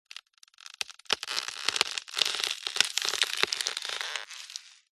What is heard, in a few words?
ice thin crack lower pitched